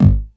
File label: bass drum, drum & bass